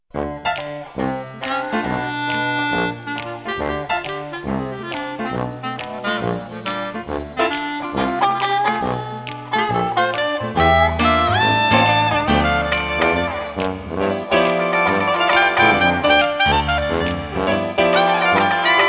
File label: BLUES; Cascom